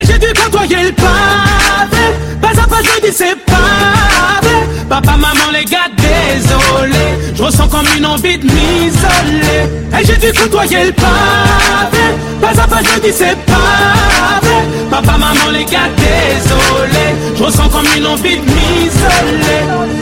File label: french song